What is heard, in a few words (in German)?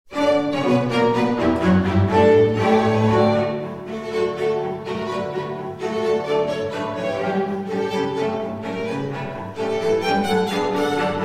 Polka